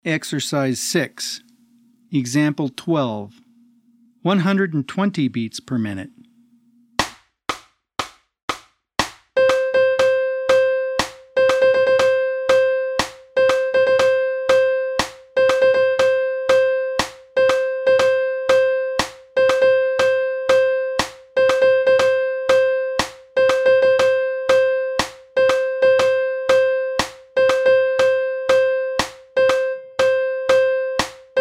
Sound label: 120 BPM